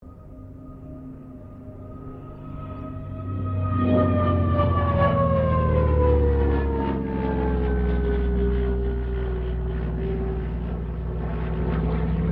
AIRCRAFT CA-13 BOOMERANG PASS DISTANT; Airplanes

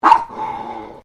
Animal Dog Chihuahua Bark Single Snarl; Newcastle; Various domestic dogs; Dog Sound Effects; Dogs (Sound Effects:Animals); Foundation1

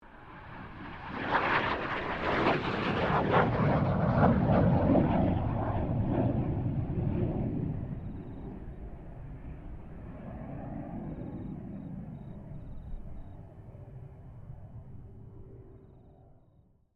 AIRCRAFT FA-18 HORNET PASS

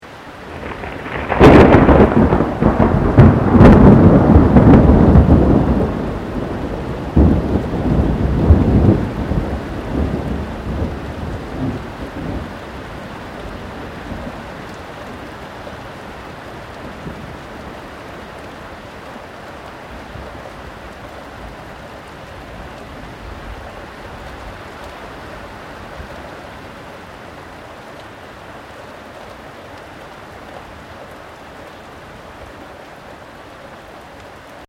THUNDER CLOSE RAIN; Elemental weather effects

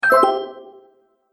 Magic Purchase Brief Ding; Cartoon Sound Design Sound Effects

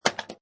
Pinball Sound Clank With Ball Travel; Video Games

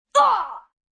Impact Ugh, Grunt, 1 boy just got hit; Pain

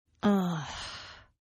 Groan Female; Groaning; Voice

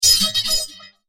Martian Metal Strike; Alien Sound Effects; science fiction sound effect; Andrew Fleming

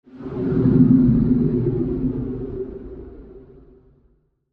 Sci Fi Spaceship; Spaceship Pass By; Spacecraft

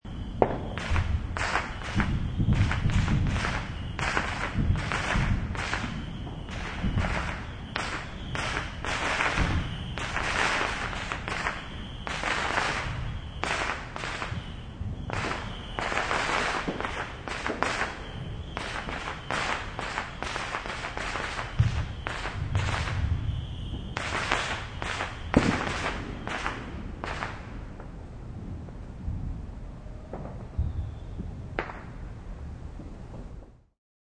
Fireworks in Neighborhood, Neighborhood fireworks; Backgrounds

